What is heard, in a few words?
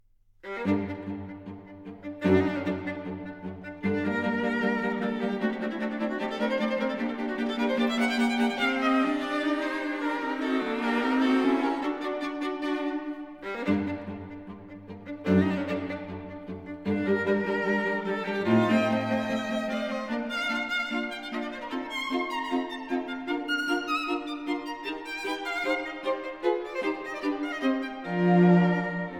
String Quartet in G minor; Haydn; HIII No.74, Op.74 No.3 The Horseman